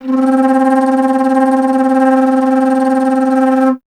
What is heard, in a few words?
Alto Flute; Miroslav Vitous; Solo Instruments